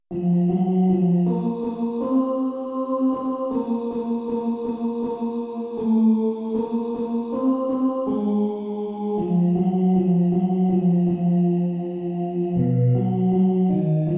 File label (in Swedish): ett litet stycke brod tenor